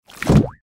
icon falling; Fruity Burst 2

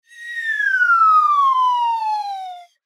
bonus flying whistle; Highland Reels

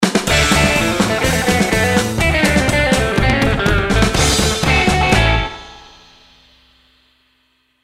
win super; Wacky Waves

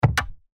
spin start; Stampede Call of the Pride; Eyecon

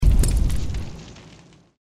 windup end; tiki-beats; eyecon

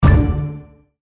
hammer land; Crystal Furnace

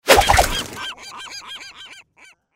gulls leave; Lucky's Fish and Chips

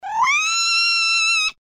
scream; Bouncy Balls 2; orange

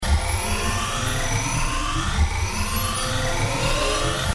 anticipation loop; Fondant Favourites StarTrail; Eyecon

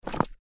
cascade Fall; Dynamite Digger Eureka

